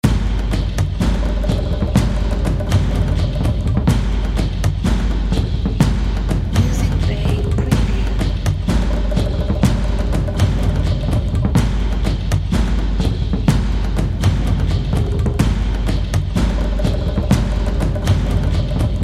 Tribal Hunt

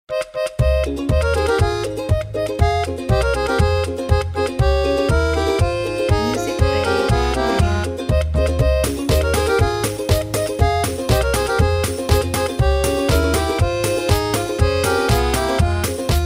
Russian Folk

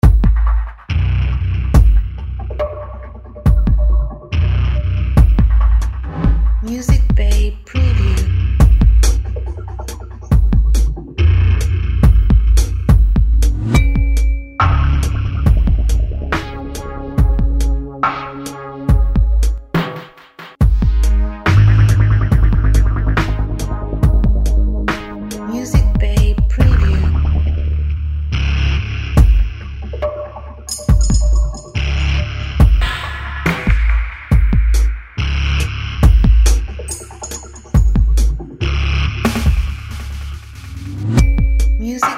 Low Trip Hop Tension

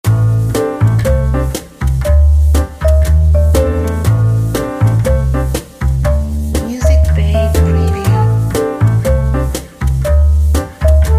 Melancholic Jazz

